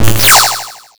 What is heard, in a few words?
Retro Weapon Electric